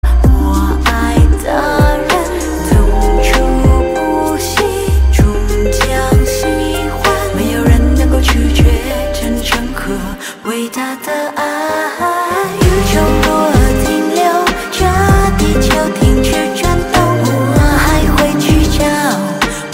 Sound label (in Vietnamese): nhac chuong ban tieng trung chinese